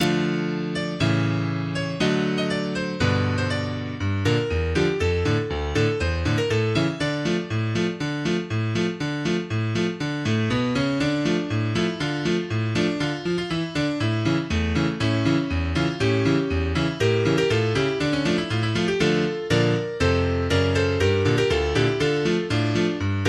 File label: Bossa Nova